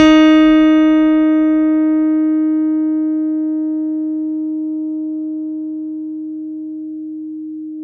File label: D#4 FF, Piano cp80